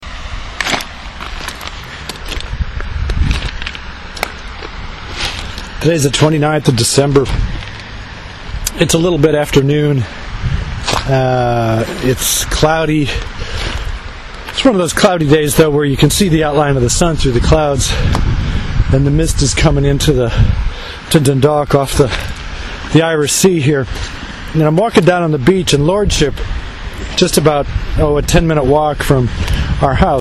dundalk bay foghorn

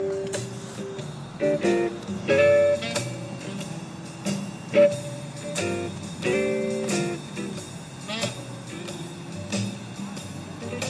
some serious jazz going on in this taxi

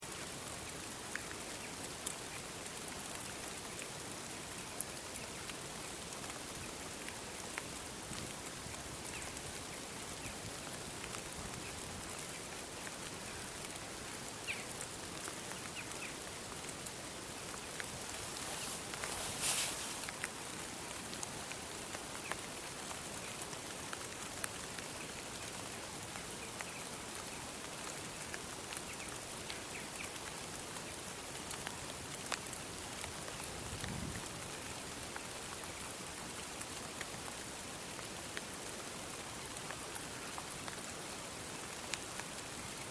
rain rooks and railways; uksm

